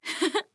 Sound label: Heh Heh; Paladins; Skye